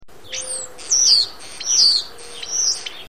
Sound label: eu starling